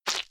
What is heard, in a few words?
hand sanitizer push